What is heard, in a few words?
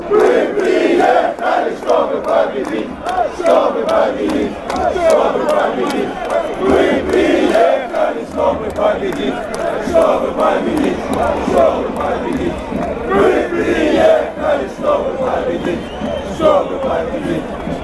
cska chant